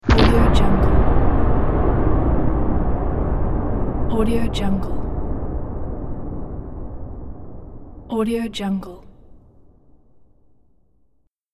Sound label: atmospheric exploding smash; sonic-boom